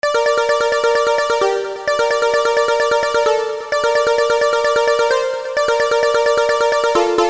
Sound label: Pluck